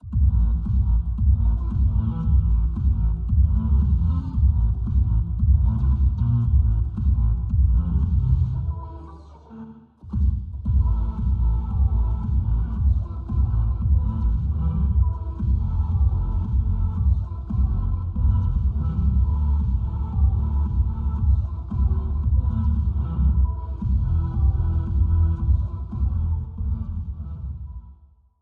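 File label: music; CampusPark; Life is Strange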